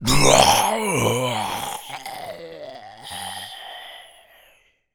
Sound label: Death, Zombies; zps; Carrier